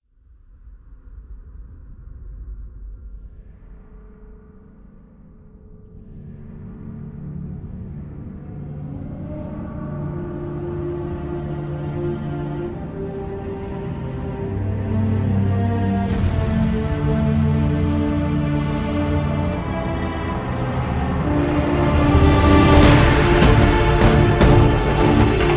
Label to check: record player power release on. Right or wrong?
Wrong